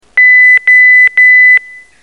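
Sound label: OpenSky Emergency, radio sounds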